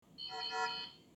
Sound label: alien